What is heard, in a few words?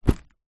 cool bag fabric set down